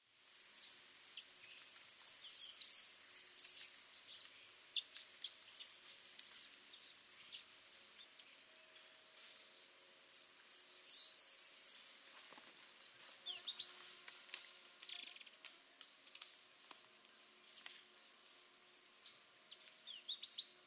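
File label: Speckled spinetail, bird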